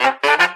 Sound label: squawk box, Stabs